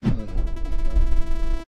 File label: right slowdown really hard; Pitch Black - A Detective's Demise